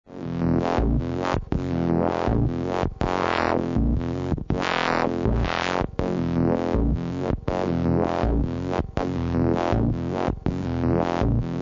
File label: seq filter